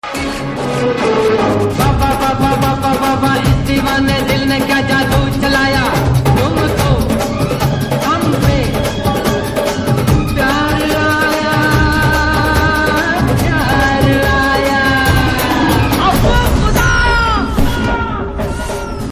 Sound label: telugu announcement tune ringtone